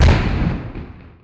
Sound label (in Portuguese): tiro canhão